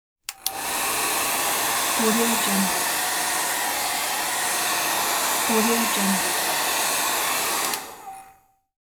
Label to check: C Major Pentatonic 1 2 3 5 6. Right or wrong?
Wrong